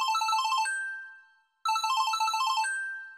Wakeup Ringtone; Infinix

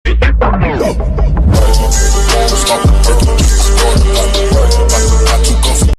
deep session mix ringtone

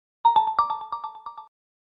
Oppo A53s Original Ringtone

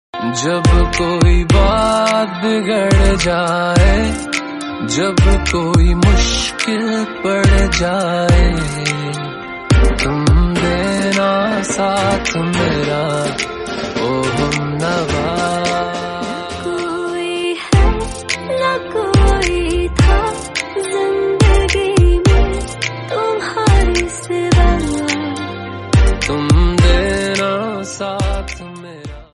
Old Hindi Love Song Remix Ringtone

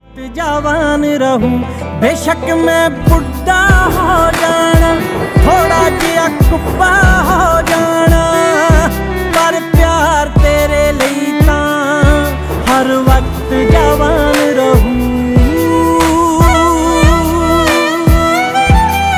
Top Punjabi Best Song Ringtones

